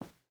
footstep hide carpet walk; Rust

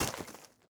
plant seed; Rust

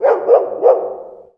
dog; Call of Duty Modern Warfare

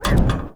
zoom out

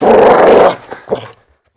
dog growl

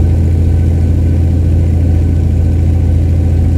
stuka cockpit, engines; War Thunder

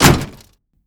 door humvee close; Call of Duty Modern Warfare; Doors

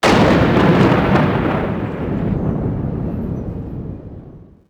thunder

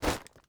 drop tarp; Rust

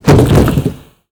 bodyfall tin roof

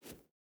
footstep barefoot sand jump start; Rust

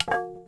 Drop Staff; Civ4

